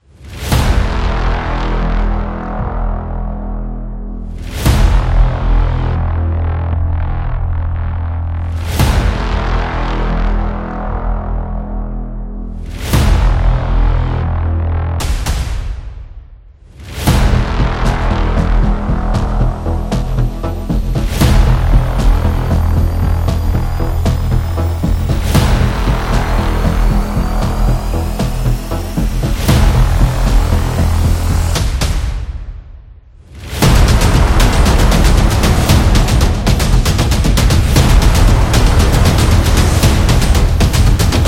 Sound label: Teaser Epic Intro; suspense